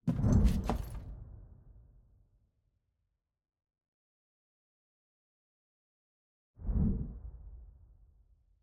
Turret First Time Enter

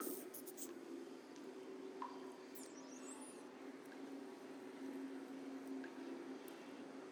Sewer

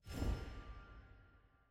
Hover Hint; Sevika Map

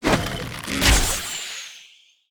attack heavy cast; bloom minigame

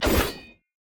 Attack Light; bloom minigame

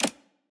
Safe Click; Puzzle

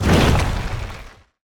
Enemy Attack Throw Cast Rock; bloom minigame

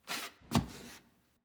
Footstep Wood Rotate

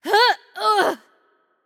Death; bloom minigame

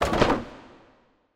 furniture logs; main hall

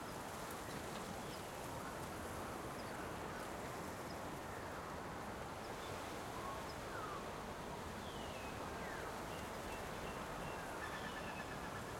Ambience Stairs

